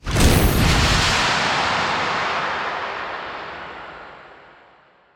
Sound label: atom launch; OpenFrontIO